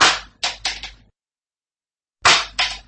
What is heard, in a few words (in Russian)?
metla padenie